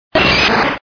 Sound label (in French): Cri